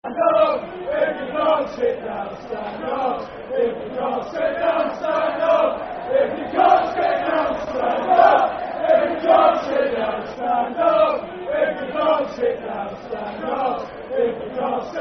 Stand Up If You Can't Sit Down, Football Chant (Soccer Song); Brighton Bhafc